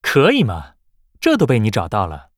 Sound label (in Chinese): music; 唐二